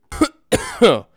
male Cough; peopleSounds; workingPeople

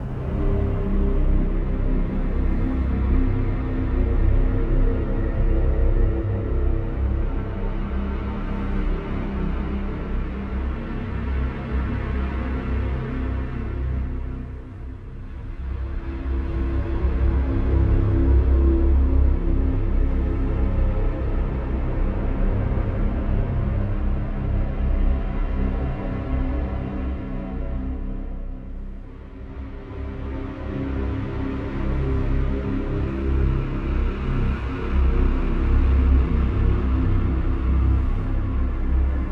C Bass Drone Move, Ambient Textures; Instrument Drones

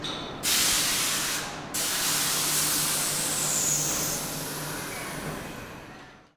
steam Release Clanks; trains

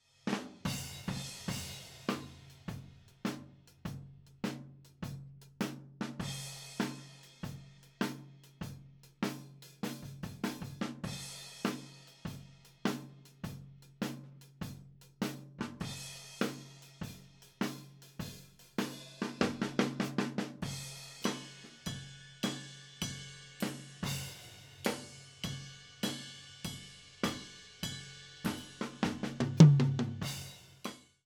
Tom

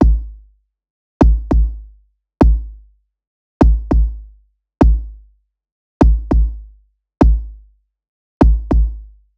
Kick

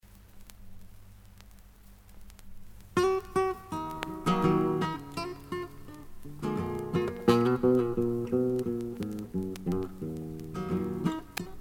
Guit Voice

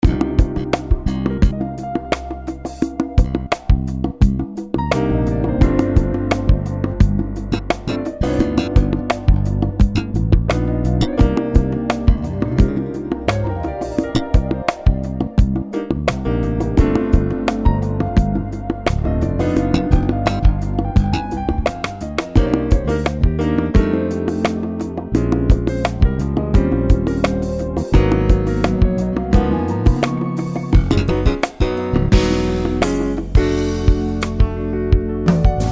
Music background pads

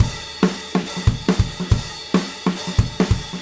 Drums Rock Chorus, loops; Alex Sanfilippo

